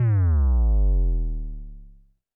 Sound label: Roland TD-20 Percussion